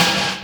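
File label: HI-HAT; FUNK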